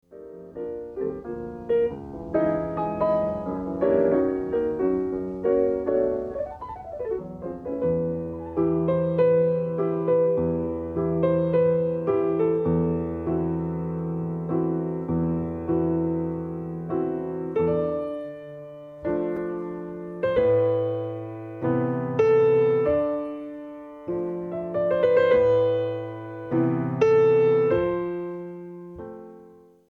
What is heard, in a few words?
Medley Piano Bar